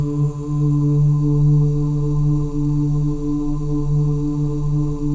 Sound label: BGV OOS; Spectrasonics; Symphony of Voices